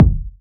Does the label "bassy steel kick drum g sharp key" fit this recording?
Yes